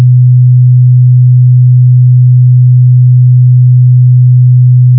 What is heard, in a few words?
125Hz tone